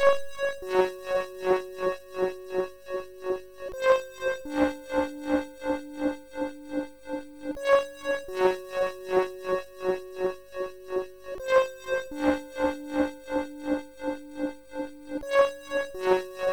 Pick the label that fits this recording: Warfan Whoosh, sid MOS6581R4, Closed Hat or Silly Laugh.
sid MOS6581R4